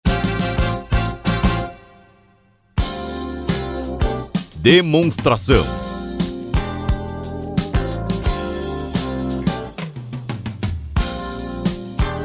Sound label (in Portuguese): Trilha